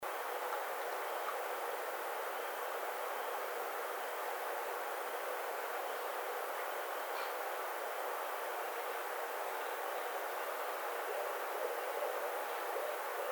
Barred Owl

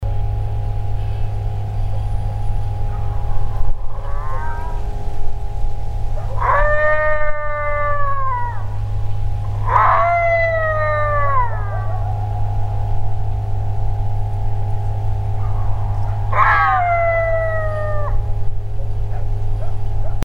Illinois Howl unfiltered short version

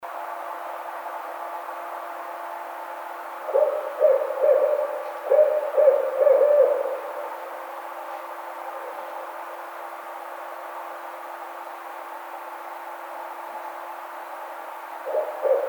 Barred Owl

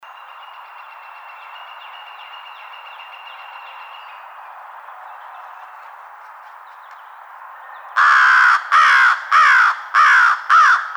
American Crow

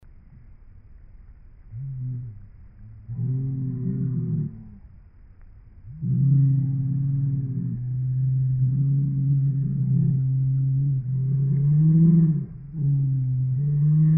Cattle

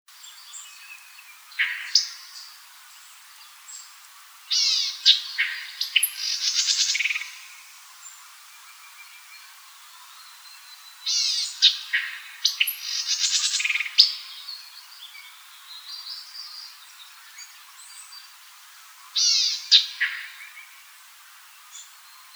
White-eyed Vireo

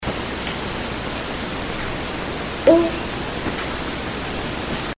voice, bigfoot

